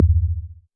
Voyager Tom, Moog Voyager

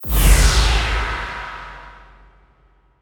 Fall, Hits & Falls; Zero-G Trance Inducer

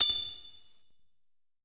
SYN BEL; AKAI S-Series CD-ROM Sound Library VOL-5; FX SYNTH BEL

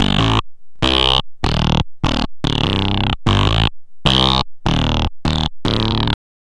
Bass, Synth Bass Loops

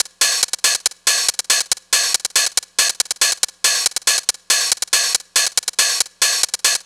NRG Cymbal; Drums; Cymbals; Trance; Sonic Foundry (Sony Creative Software); Trance NRG VOL-1

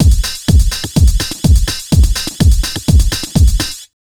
BEAT, Disco House; USB Soundscan vol.20; Fresh Disco House I; AKAI

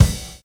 Dose Kick; Sonic Foundry (Sony Creative Software); Drums & Drones; Construction Kits

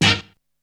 Hit; ZERO G - Datafile DISC 2

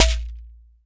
CHEKERE SHOT

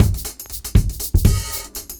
LOOP, 70's Breakbeats; USB Soundscan vol.46; AKAI

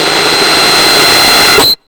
NUCLEAR, Hard & Loud Techno; USB Soundscan vol.01; AKAI

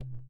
THROAT; Spectrasonics; Metamorphosis; THROATERS

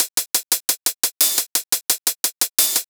D&B Percussion Loop; neuro

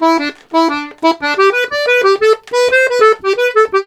GIGUE, Accordions; USB Soundscan vol.40; AKAI

